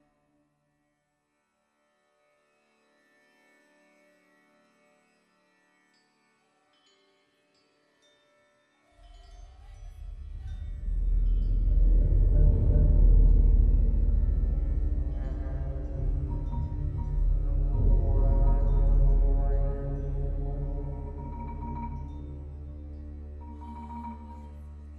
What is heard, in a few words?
score Graveyard; Journey